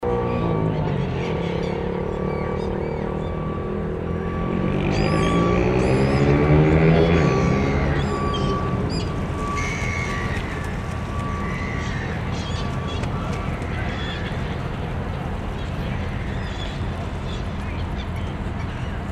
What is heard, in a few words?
Building Site Location